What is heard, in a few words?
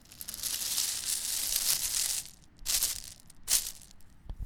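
Gravel Being Disturbed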